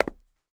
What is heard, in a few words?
concrete footstep; metro